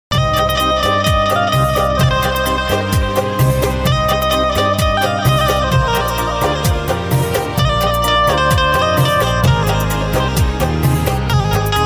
banjo ringtone banjo ringtone hindi song benjo ringtone instrumental